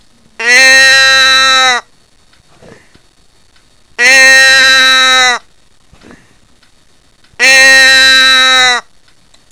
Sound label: fawn bleat